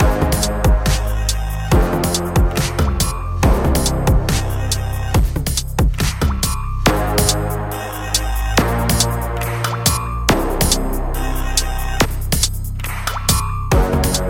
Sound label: dark heat